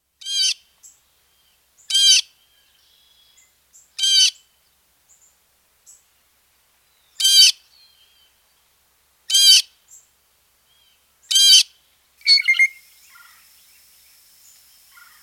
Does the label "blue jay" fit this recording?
Yes